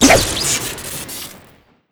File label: cleaver throw crit; tf2